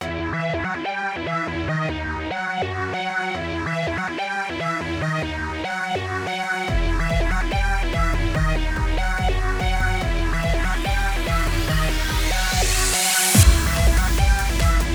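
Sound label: sawtooth wave synthesizer bass, fast hand vibrato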